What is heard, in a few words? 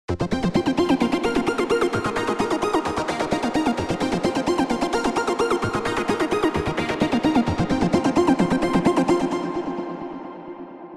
Arp Synth - Grand Hall; OldSkoolVerb